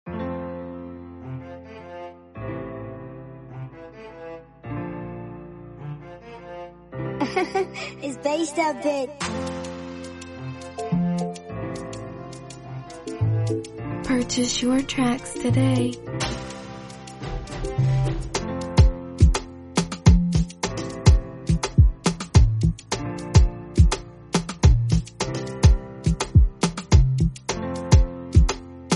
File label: Afrobeat Instrumental